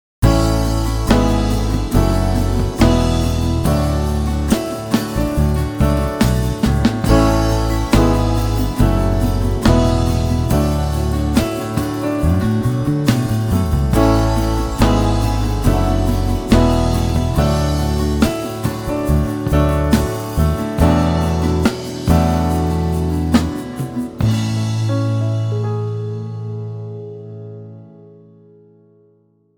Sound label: INSTRUMENTAL